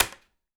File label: Palette Snap, Wooden Palette